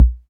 studio steel kick drum sound b key, bass drum single shot; kick drum samples